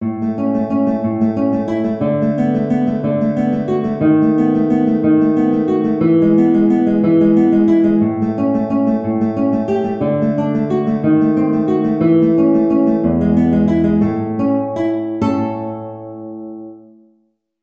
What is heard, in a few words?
Prelude In A, guitar